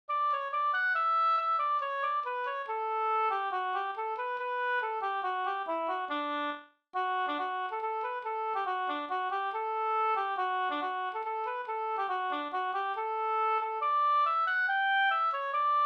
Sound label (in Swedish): folkdans, brudedansen; Dybeck